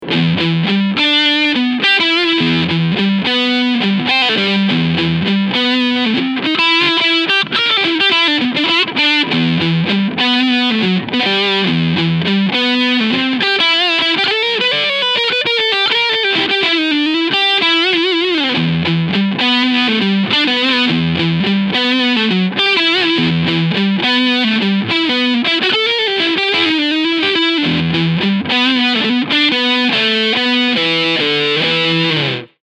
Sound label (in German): EarthQuaker Devices Hizumitas Sustain FULL Tone 9 Uhr Leadsound